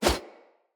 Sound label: Split Sword Whoosh Fast; Weapons; Player; Swords; Starter